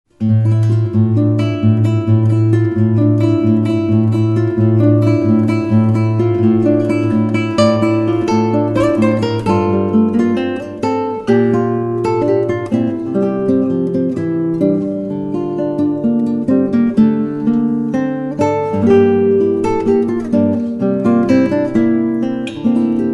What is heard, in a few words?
Aeolian dance